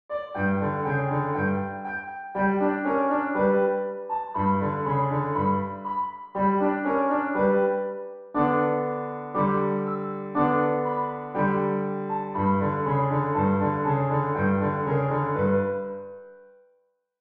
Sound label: farmer boogie piano duet